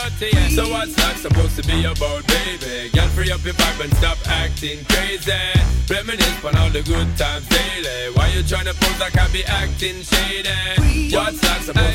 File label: CLUB